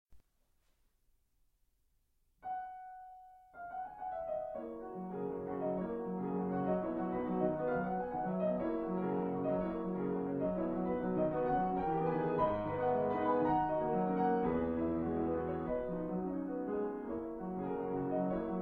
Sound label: Piano